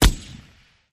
M60 Machine Gun Cal7

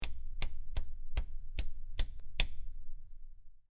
Rotating Switch Clic

